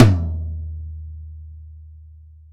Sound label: tom, velocity tom; VELOCITY TOM 1